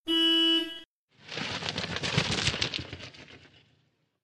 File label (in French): klaxson et envol; au secours du professeur